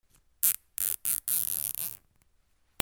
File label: HOUSE